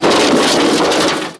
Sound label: kill enemy; escape the fuzz